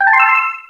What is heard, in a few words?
click